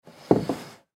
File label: Chair Wood Table Dining Room Push In Under Table; Chairs; Household; Blastwave